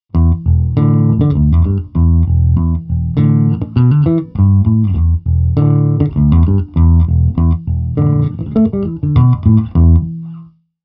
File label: Fender 60s JB